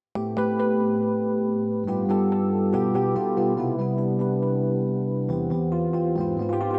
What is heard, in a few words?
H delay